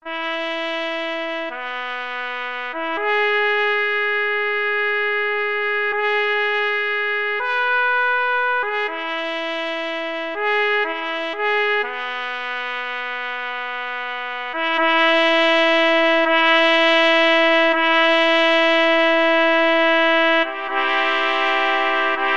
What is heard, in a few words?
Fanfare, brass ensemble; Capriccio